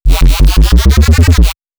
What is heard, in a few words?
F vocal-y, bass; willy